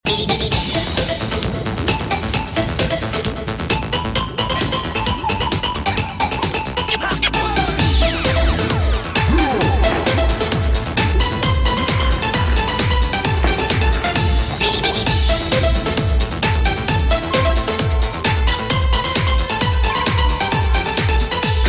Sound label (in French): sonnerie -